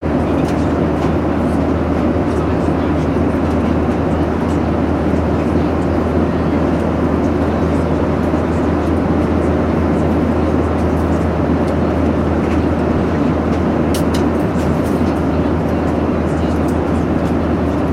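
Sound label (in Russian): самолет Boeing 737 jet звук из салона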